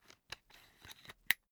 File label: umbrella telescopic extend slow